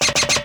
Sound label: clown spider step, Footsteps